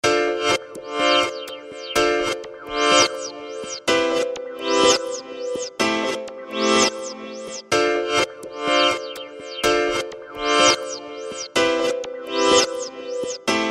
Rev piano

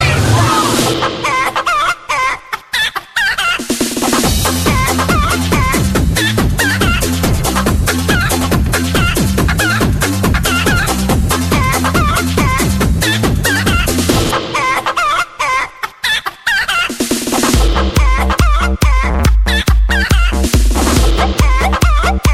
Chicken song

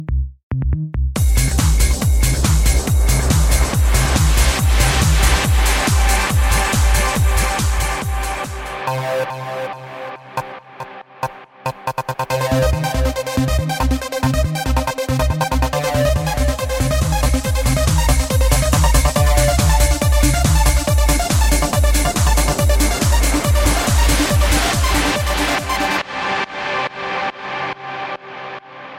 Trance

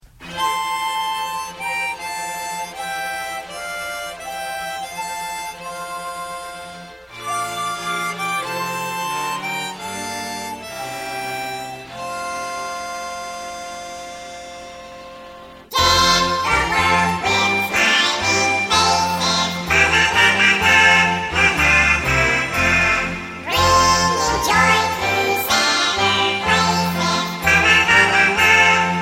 Deck The Halls

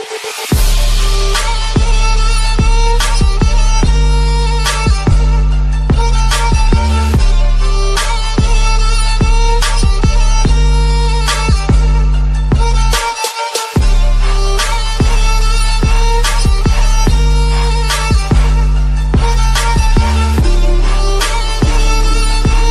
Trap

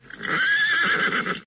Horse whine

